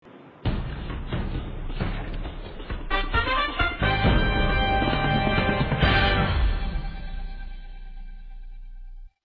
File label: jingle; sport ro